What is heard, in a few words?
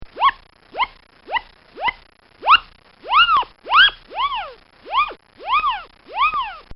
Comical Sound Series Whips And Whoopies; Funny Sounds